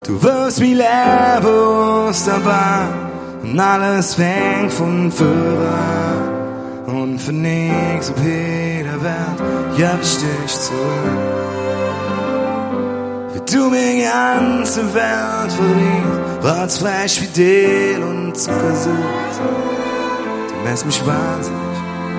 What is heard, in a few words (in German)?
live mit orchester